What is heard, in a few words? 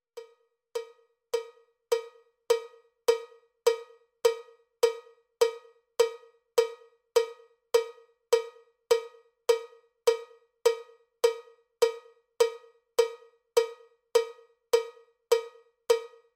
cowbell click track bpm 79; cowbell click track bpm 103